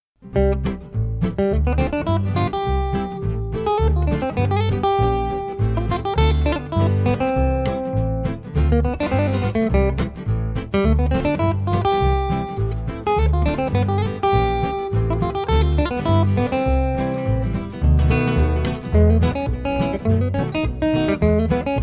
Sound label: swing, trio jazz